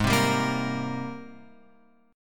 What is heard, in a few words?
Gsharp Major 9th; G#M9 Chord {4 3 5 3 X 6} strummed